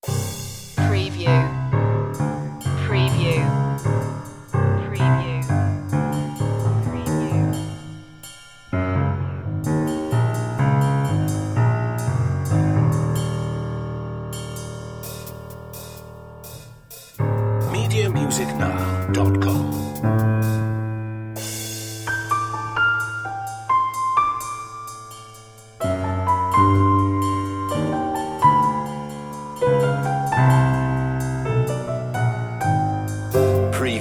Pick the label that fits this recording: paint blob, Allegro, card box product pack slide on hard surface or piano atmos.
piano atmos